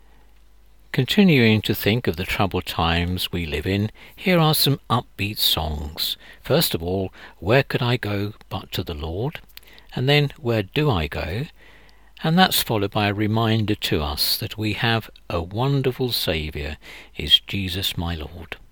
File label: Intro Choral; A Wonderful Saviour